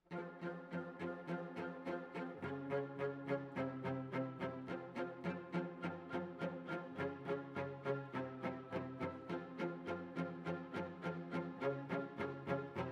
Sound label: Cellos